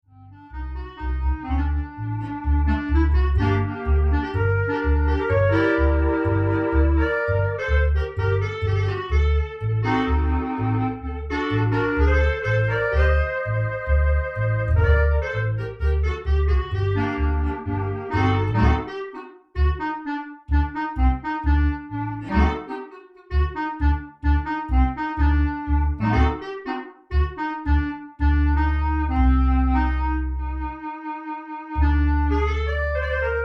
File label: Klezmer